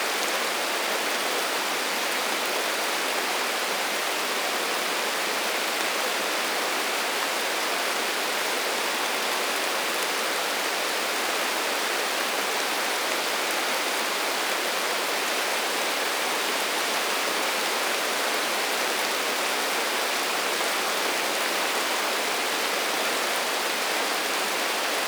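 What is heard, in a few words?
ambience rain medium outside forest; anbience rain medium outside forest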